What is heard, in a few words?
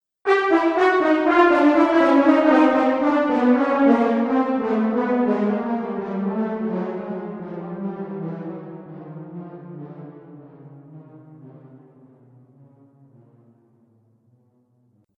Cartoon Failure Brass Delay; Cartoon Music Sound Effects